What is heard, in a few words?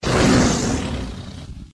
bonus lion escape; slots; sRoma